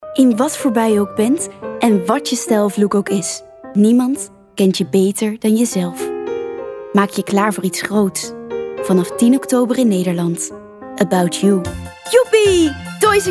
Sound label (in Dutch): Stemdemo